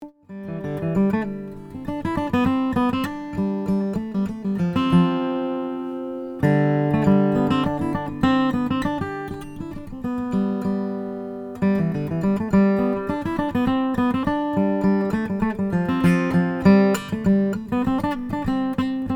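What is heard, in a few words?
jomc deluxe custom brazilian rosewood, guitar; dana bourgeois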